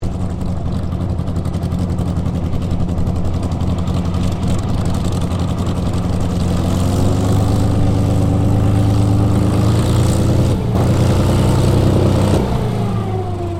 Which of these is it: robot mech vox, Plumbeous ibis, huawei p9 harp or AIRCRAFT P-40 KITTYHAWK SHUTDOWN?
AIRCRAFT P-40 KITTYHAWK SHUTDOWN